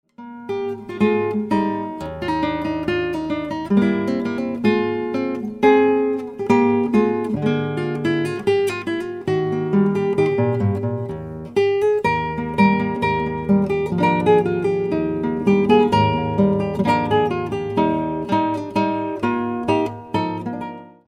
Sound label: MINUET